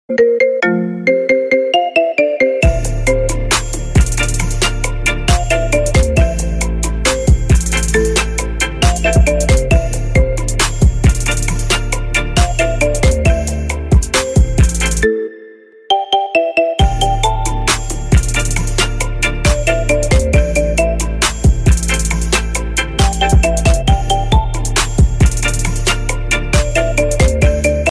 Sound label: remix